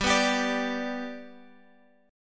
G Major G down Guitar Open G

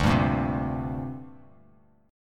D 7th Suspended 4th Sharp 5th down Bass Standard; D7sus4#5 strummed

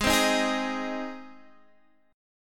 G sharp Major, Guitar Half Step Down

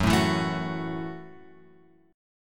F sharp Minor 7th; F#m7 Chord {2 0 2 2 X 2} strummed

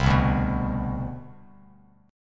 C Major 7th Suspended 2nd, C, down, Bass Standard; CM7sus2 strummed; JGuitar

